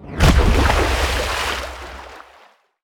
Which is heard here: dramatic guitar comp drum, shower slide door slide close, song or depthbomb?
depthbomb